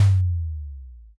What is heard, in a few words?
odaiko